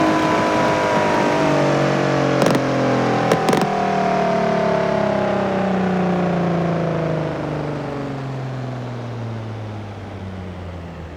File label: throttle off, vehicles explorer; gmod; sgmcars